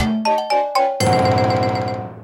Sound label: Lose; Donkey Kong Jungle Beat; Chopperbird Races